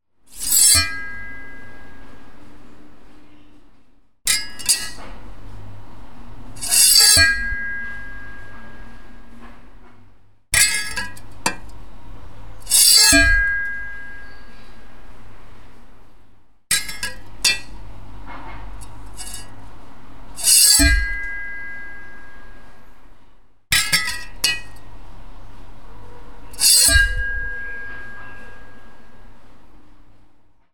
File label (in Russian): zvukovoj effekt kuhonnogo nozha podnyat i opushen iz derzhatelya nozha